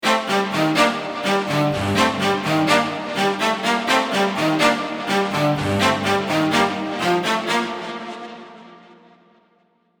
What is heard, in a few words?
Strings, Techno; Medical; Unison Techno Collection for Serum